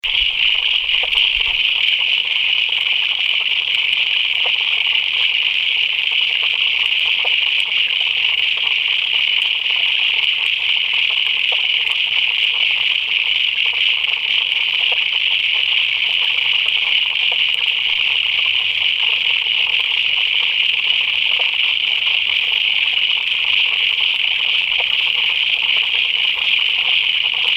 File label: frogs pier